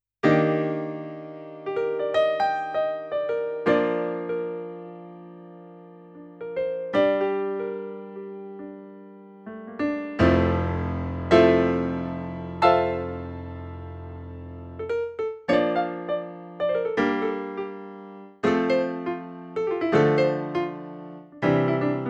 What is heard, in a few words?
Piano